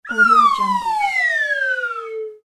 slide whistle down

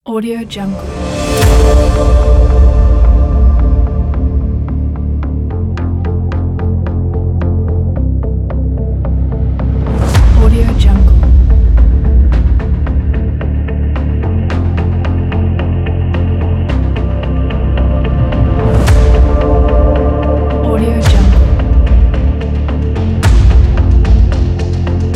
cinematic space

